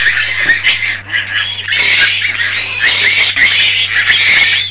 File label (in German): VOGEL